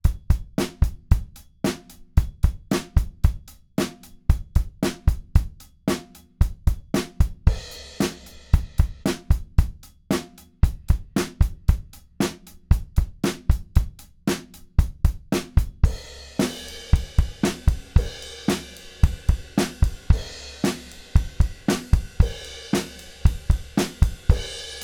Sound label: DRUMS; Revive Audio Modified behringer ADA8000; Shure Beta 57 on the snare; Motu 896HD